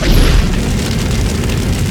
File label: Fire Breath Loop; Destruction